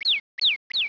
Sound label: deer minimize; 250 Desktop Themes